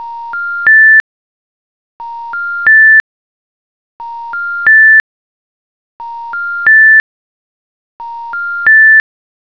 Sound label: call drop, phonetones; Asus P835